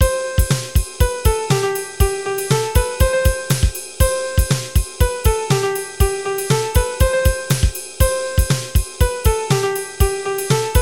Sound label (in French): Carillon